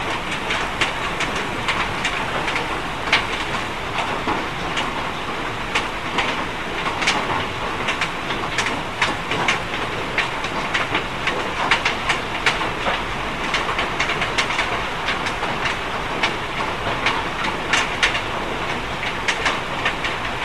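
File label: rain sound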